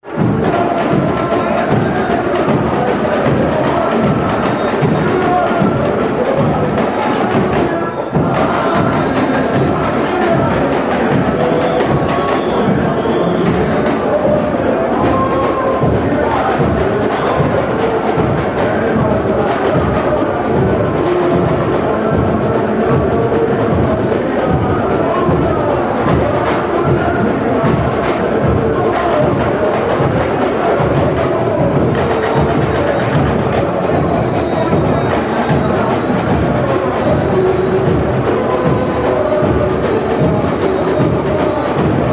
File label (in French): ecole salsa carnaval; bresil